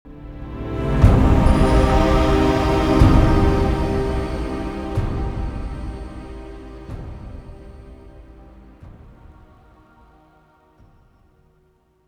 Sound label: Look Lab Light